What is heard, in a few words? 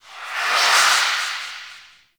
Minigame In Range; Birth Minigame; Warframe; Jade Shadows